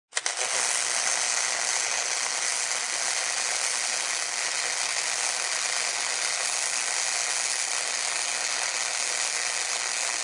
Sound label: Arc Welder Running, Welding: Industrial arc welder, long continuous run; Welder Sound Effects; Machines